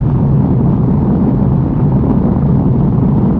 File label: earthquake